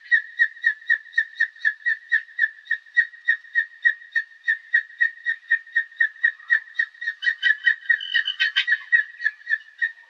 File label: Birds Various, Birds; outdoor